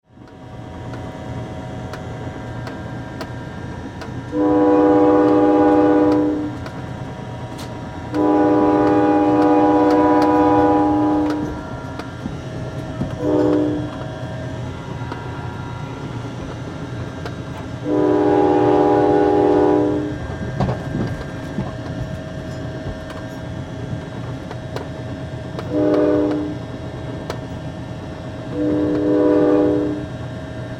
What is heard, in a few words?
Vehicle Passenger Train Ride Interior Whistles Blow; Trains; SFX Bible 6